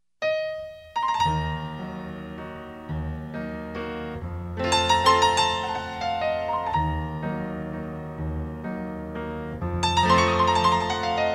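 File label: music piano irani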